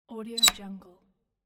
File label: squeaky basic door latch close; domestic sounds